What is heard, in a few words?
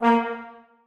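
trombones staccato a#3; Sonatina Symphonic Orchestra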